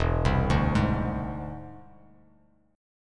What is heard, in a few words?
E Augmented 7th E down; E+7 arpeggiated; JGuitar